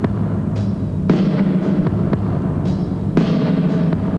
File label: beat